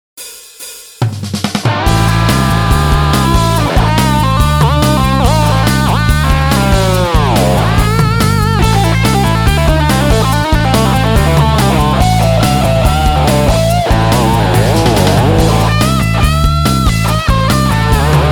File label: patch demo